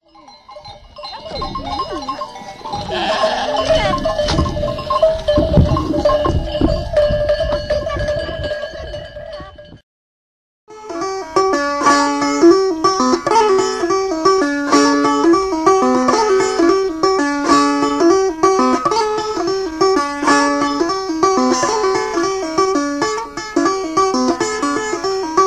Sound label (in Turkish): üç telli